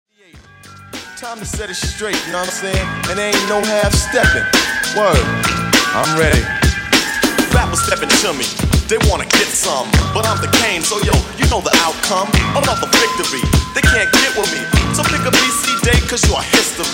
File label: 80s HipHop Redrum 100Bpm